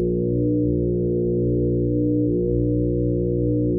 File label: planewater